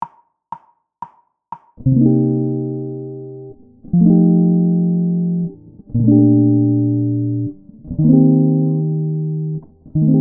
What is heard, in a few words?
chord